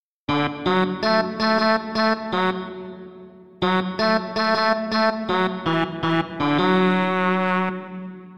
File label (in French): xylophone et trompette, Xylophone, synthé et trompette; Il arrive aussi à la dixième mesure et double le thème joué par la trompette.